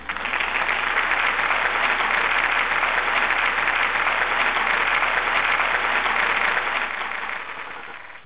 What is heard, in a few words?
crowd applause